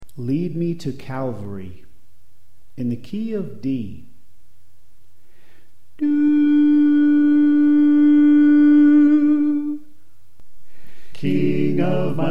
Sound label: Tenor Left